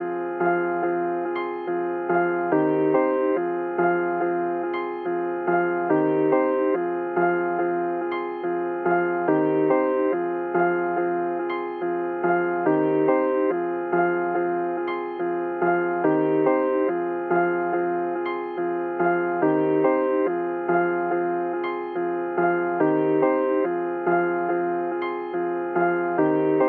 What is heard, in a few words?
142 BPM F Min Piano; Melody; Loop Stems; Cymatics; Missed Payments